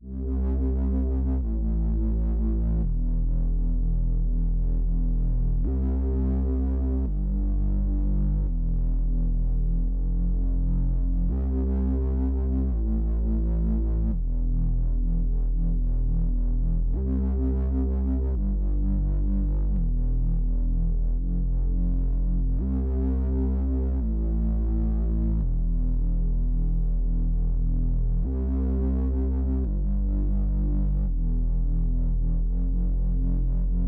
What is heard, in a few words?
85 BPM C Min Bass; Loop Stems; Cymatics; Miami Guitar Pack - Pt 2; Rust